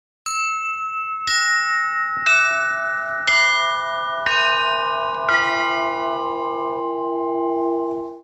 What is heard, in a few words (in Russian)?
6 kolokolov; Sizran